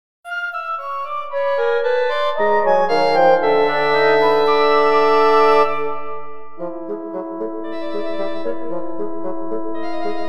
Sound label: Woodwind Quintet